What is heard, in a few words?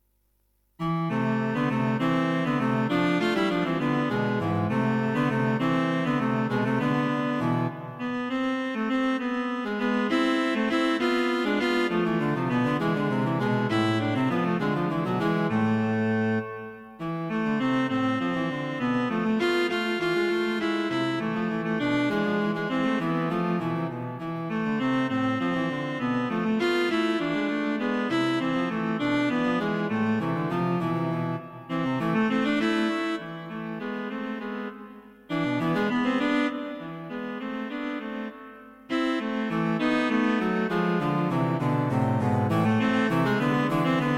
Four Cello Duets; Four Cello Duets - No.3